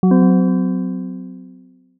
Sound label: horror metal distant